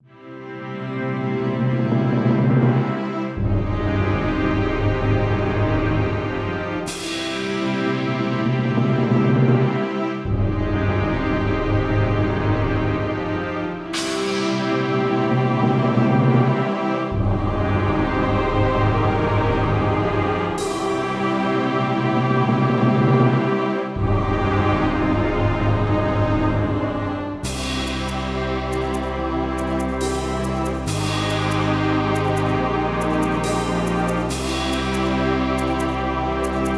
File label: music; cheesy-magic-quest; dragonFirefighter